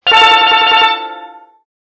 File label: steel